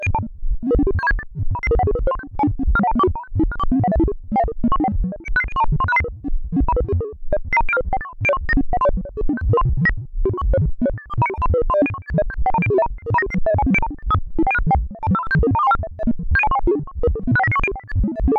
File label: Strange Noise